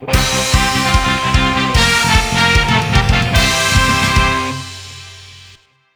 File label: Match Win